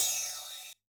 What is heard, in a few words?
Op Hat, drum